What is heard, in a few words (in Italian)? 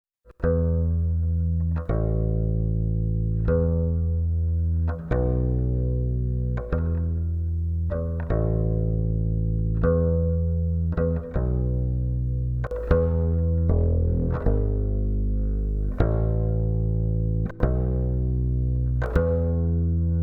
Contrabbasso